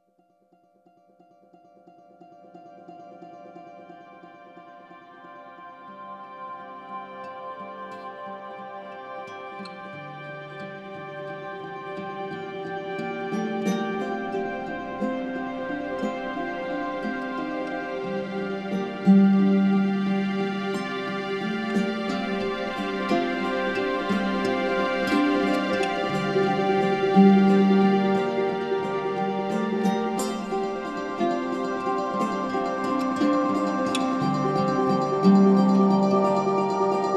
ronroco slowmotion

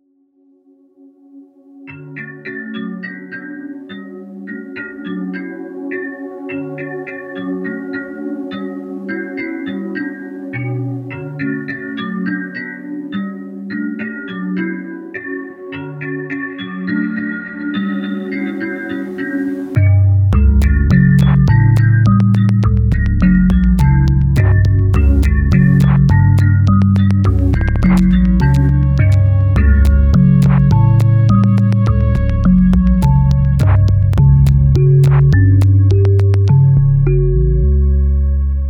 kalimba

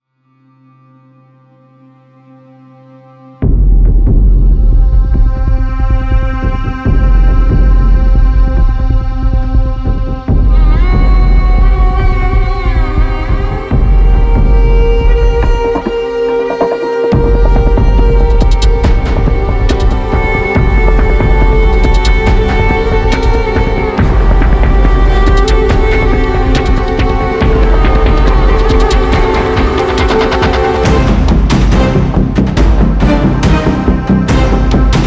rytmikbeats

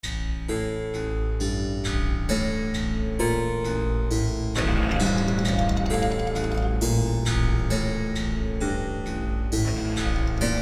monochord

